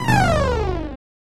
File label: Fire; Bioworm; SNES